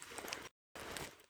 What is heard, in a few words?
torch use; STALKER; IWP; item usage